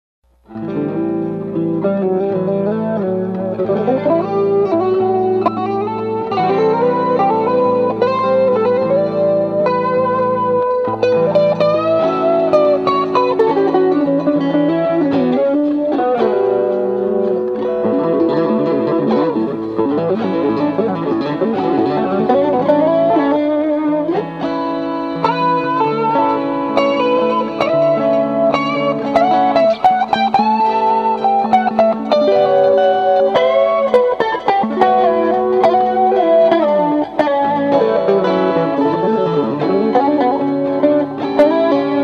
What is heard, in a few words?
mixolydian practice